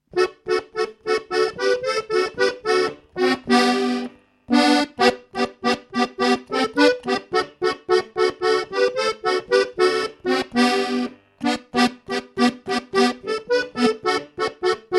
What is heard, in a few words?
Polka; Plätscher